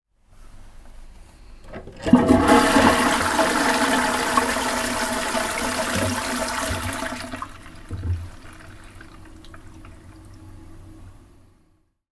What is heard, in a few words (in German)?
Spuehlung